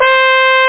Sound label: trumpet; da capo vol1; synth